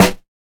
smooth bottom end snare sound f sharp key

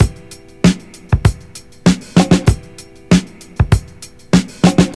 97 bpm drum loop sample e key

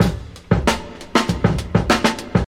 97 bpm breakbeat f sharp key

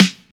full steel snare drum F sharp key

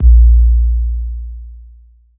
tr 808 kick a sharp key; kick drum samples

